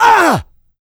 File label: pain large, player; big